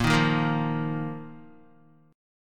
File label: A sharp Suspended 4th Guitar; strummed; A#sus4; JGuitar